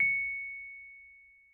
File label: C#7 LO, Rhodes Mark II; CEPCo Sample Series Vol. 3